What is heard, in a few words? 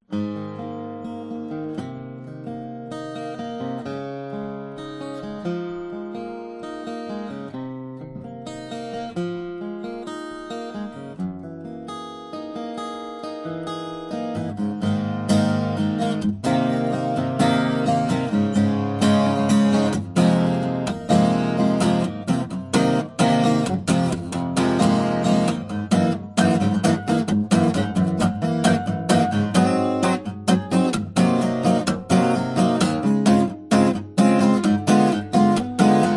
Lowden guitar